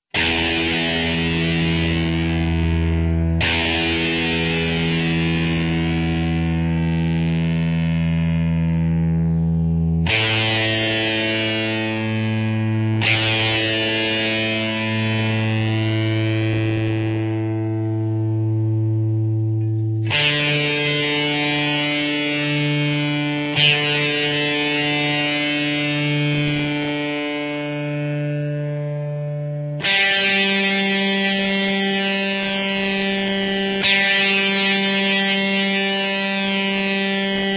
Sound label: Rock guitar; yao gun ji ta